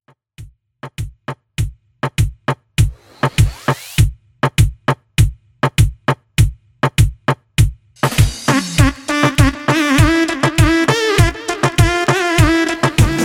Party Reggaeton 100Bpm

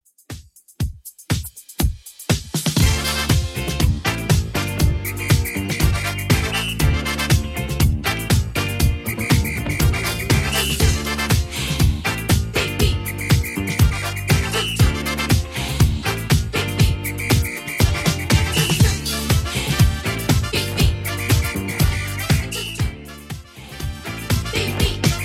70s Redrum 120bpm

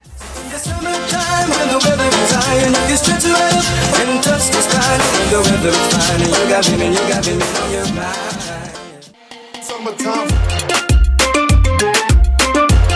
Moombah Megamix; Moombah Megamix Vol. 13; 100-107

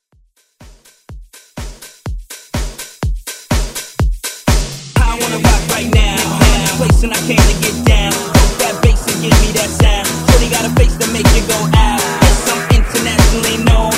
80s Rock Hype

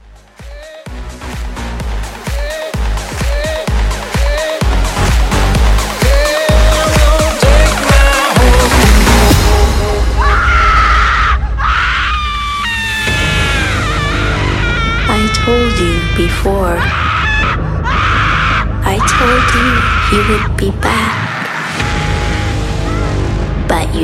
Halloween Big Horn Spook

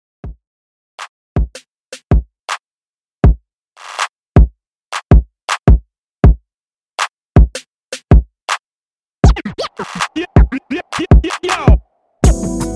Scratch Redrum, 80 Bpm

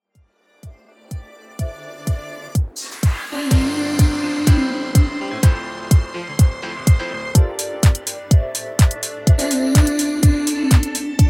Club Re Drum 125Bpm

